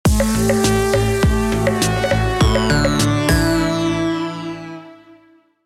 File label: Vibrant 80s Logos Percussive Logo with Synth and Bass; Folia Soundstudio